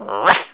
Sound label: Bark